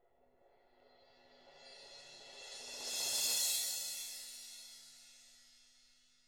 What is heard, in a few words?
swell; ILIO; Double Platinum Drums 1